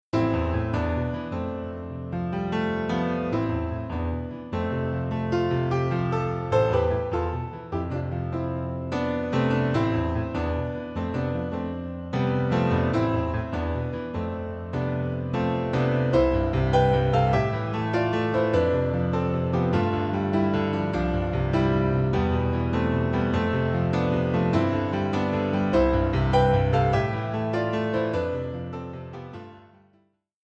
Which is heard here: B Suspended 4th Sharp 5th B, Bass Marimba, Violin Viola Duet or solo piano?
solo piano